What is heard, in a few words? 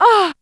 SEX; Zero G; Man Machine